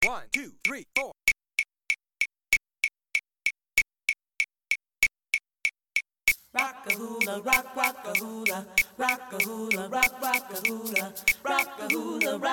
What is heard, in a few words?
Mono Click